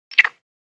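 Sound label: Monkey Ringtail Chirp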